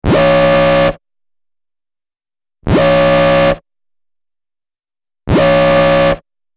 High Alert Status Alarm

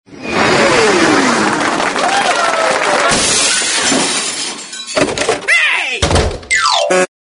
comedy whacky cartoon accent

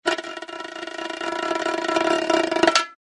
bongo buzz roll

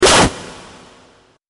noise blast

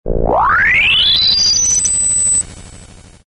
telemetry waves

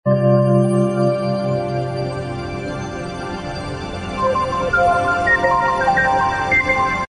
logo sunrise

